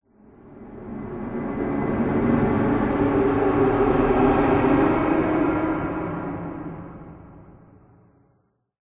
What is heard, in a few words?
horror swell dark sinister empty